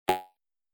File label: bump